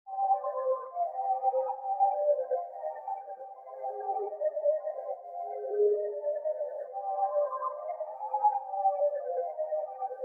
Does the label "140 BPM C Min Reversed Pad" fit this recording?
Yes